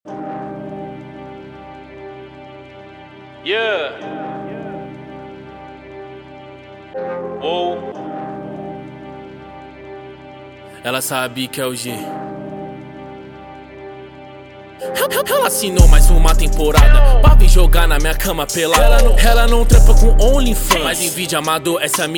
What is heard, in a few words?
rap trap